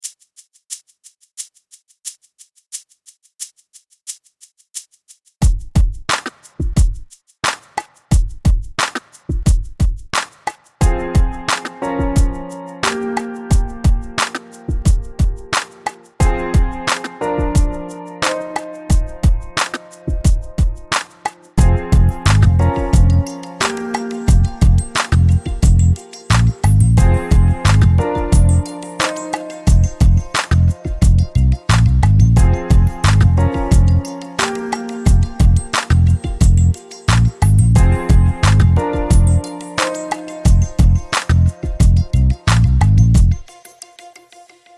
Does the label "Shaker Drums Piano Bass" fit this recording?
Yes